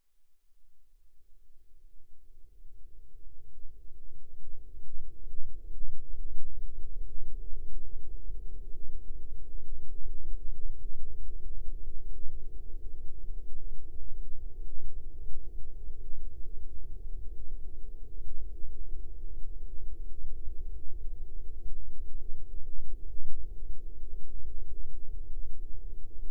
sound design drone subtle airy internal desolate quiet; zapsplat